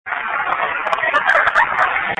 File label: girl laughing; EVP